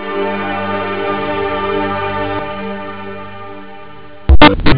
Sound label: paddom7; labrat; jazz jackrabbit 2